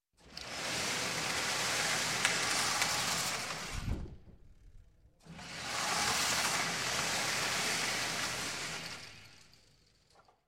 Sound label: Firing Range Paper Target, Sliding On Track; Gun, Rifle; Sound Ideas 2000 Series